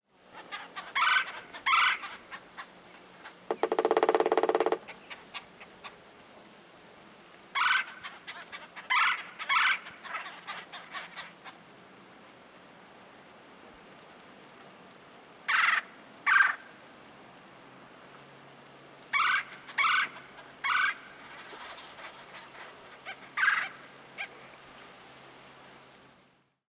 red-bellied woodpecker kwirr-call drumming; paul marvin

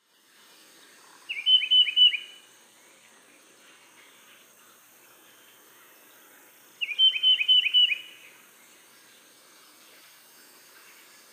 tufted titmouse

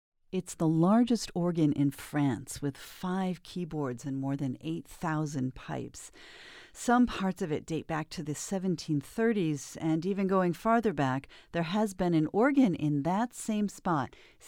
organ mix; mixdown

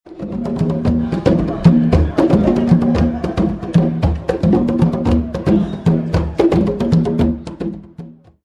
Drums; Juneteenth

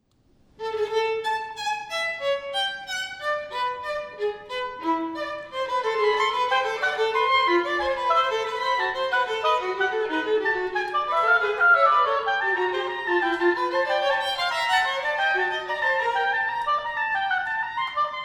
two part invention for oboe and violin; norbrook

